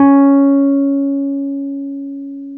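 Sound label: Wurlitzer electric piano C#4; Keyboards of The 60's and 70's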